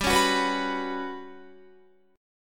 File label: G Major 7th Flat 5th, Guitar; GM7b5; strummed